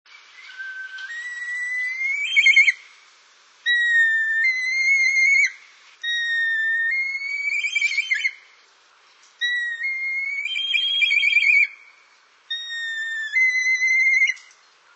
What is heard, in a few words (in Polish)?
kania ruda; Przyroda ptaki i zwierzęta